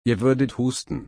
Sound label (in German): husten